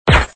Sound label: silenced; Gun Mayhem 2